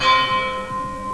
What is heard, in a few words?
CHIMER; BELLS